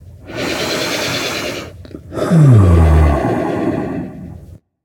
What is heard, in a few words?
breath; smite; voicepack; rama